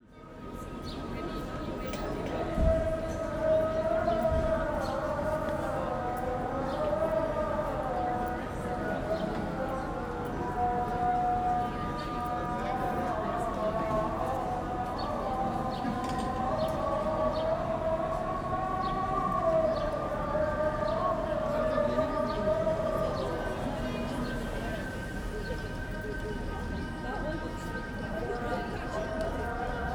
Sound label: City street large voices light birds and many muezzin away, Turkey; Charlie Atanasyan; Turkey sound library by Faunethic